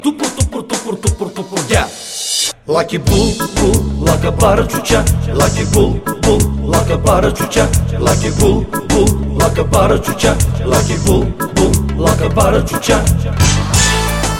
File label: Bg Instrumental